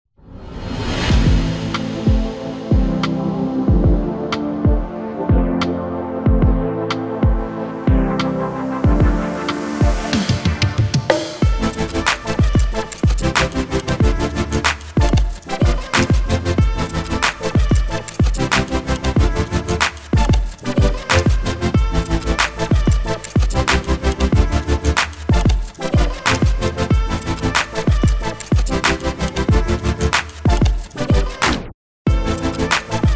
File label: future bass